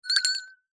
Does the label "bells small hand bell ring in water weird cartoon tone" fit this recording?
Yes